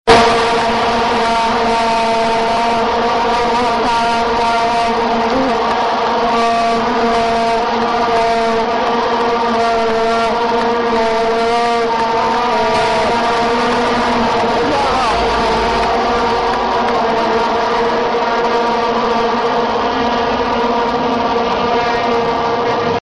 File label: flamethrower fire; vuvuzela